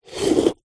household cafetiere plunger lift coffee inside; zapsplat

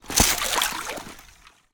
nature ice sheet small lift in water

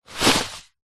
clothing denim jeans pick up carpet floor

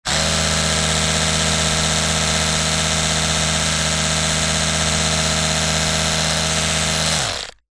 household electric toothbrush on run off close up; zapsplat

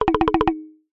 cartoon wobble flutter wood mallet style

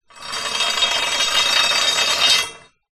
household rake metal drag concrete

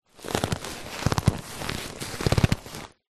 handbag leather rigid handle short

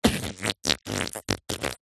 human fart; zapsplat

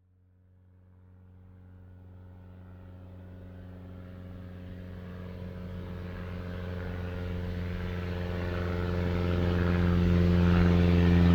transport aircraft silence twister fly by

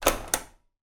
household heater bathroom switch on

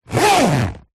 household makeup bag thick zipper

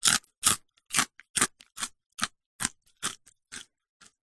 human eat crunch wasabi pea; zapsplat

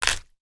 horror bone break snap; sound effects one

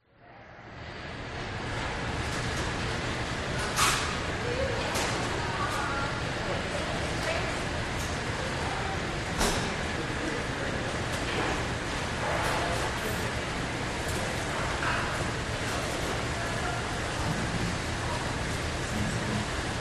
Industry Factory; audio hero

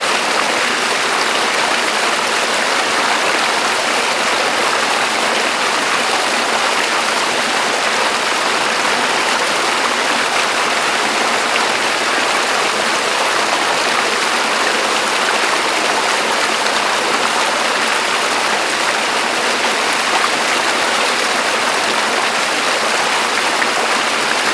waterfall small; sound effects one

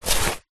wetsuit top pick up

